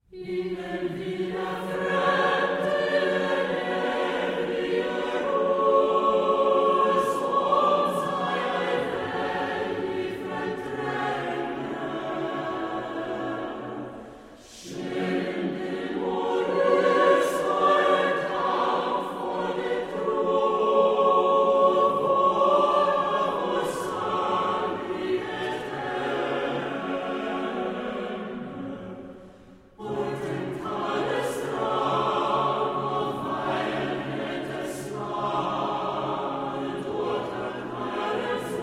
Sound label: Arr. for Choir; Traditional; Gjeilo